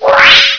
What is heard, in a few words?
Connect sound; Macworld (1997-09); Games World; Games Utilities; NetRPG 3.0.1